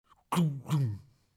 fermeture porte

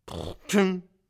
chaise métal tombé; Mobilier

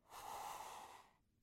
tabouret tiré; Mobilier

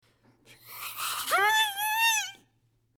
table metal tirée; Mobilier

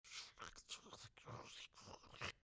ciseaux coupe papier; Fourniture

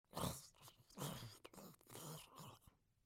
sachet plastique; mouth-fishing

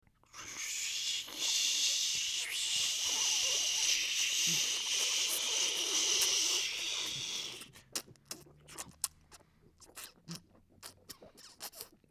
la vaiselle à la main; Liquide; Menage; mouth-fishing

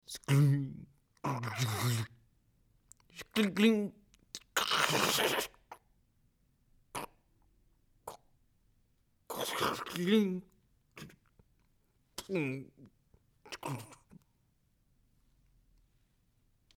massicot; Machine; papier

